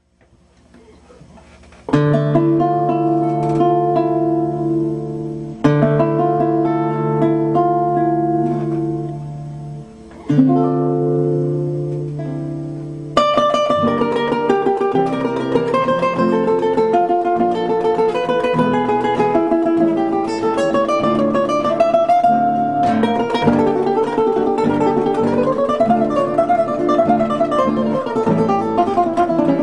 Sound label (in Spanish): Intro con Requinto y Guitarra